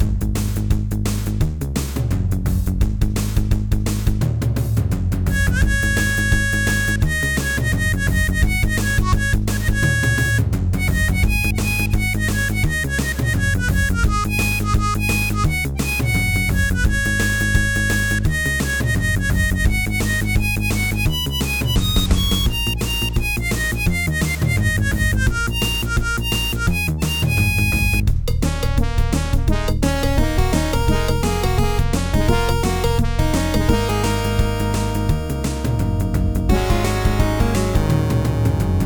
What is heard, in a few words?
short theme melon no pwm